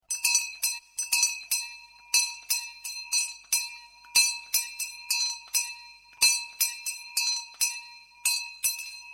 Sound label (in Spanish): esquila